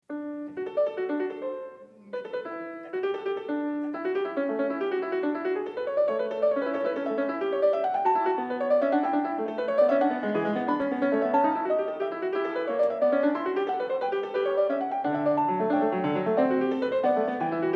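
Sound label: Gigue, piano; Partita 4 in D; BWV828; BACH; PARTITAS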